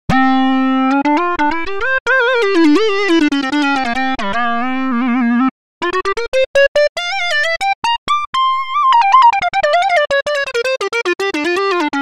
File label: minimoog; opxpro